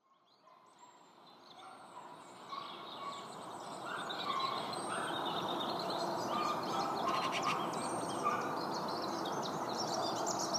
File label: Misty Walk Soundscape